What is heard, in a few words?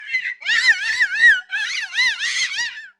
Monkey Screech; Comedy edits